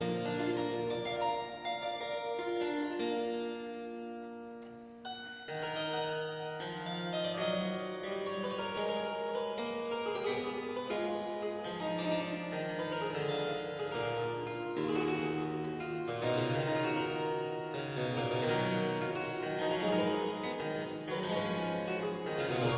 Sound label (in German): partita e moll allemande; bwv 830; clavier buechlein fuer anna magdalena bach 1725